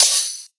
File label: Hihat, Psytrance; One Shots